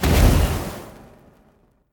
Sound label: explode; Nickelodeon - Destruction Truck Derby